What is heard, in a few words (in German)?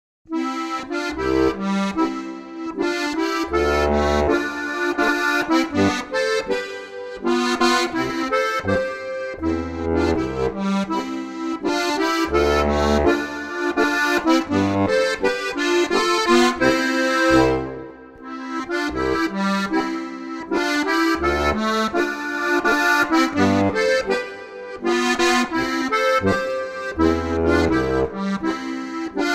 Menuett; Geigelstein